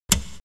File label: button; Gravity; camera